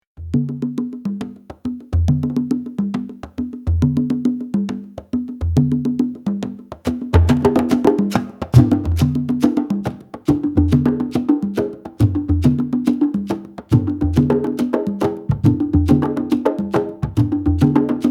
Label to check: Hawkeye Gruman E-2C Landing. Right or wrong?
Wrong